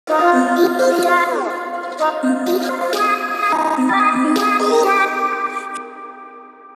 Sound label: Chromatic Vocal Chops F 126, electro pop melodies; pulse